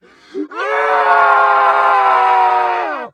Scream

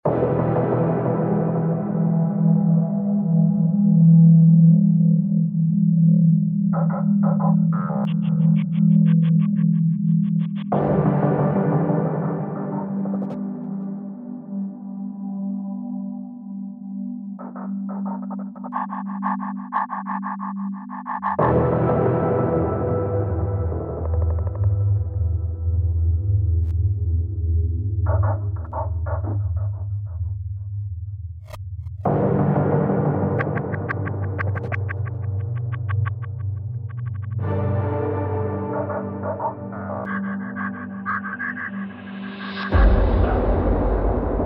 Martyr Room (Ambient); modern combat 3 fallen nation

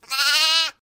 bah

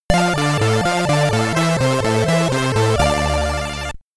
Game Over (SID Stereo), Commodore 64; Ninja Commando

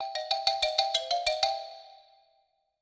licks; Sesame Street Ready Set Grover

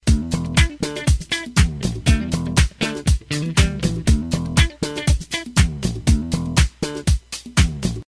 Loop Disco; gamerip

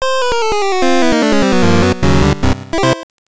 Unknown Jingle; Pokemon Trading Card Game

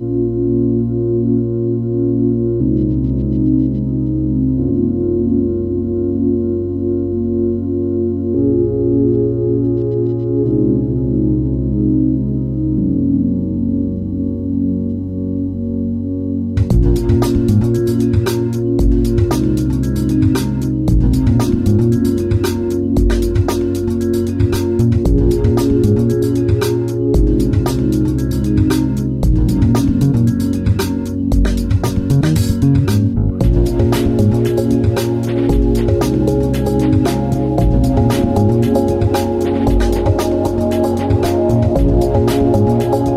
Map3D Music Loop; gear club

